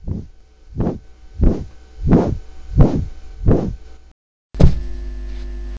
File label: flap land